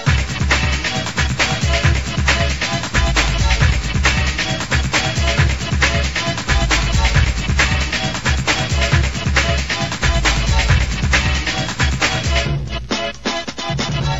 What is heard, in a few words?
loop